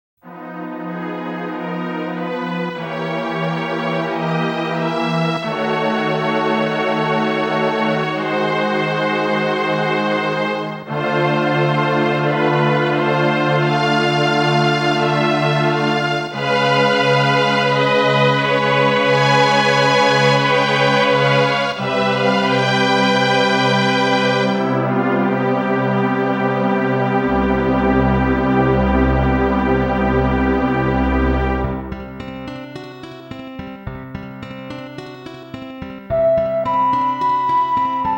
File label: Ballad Organ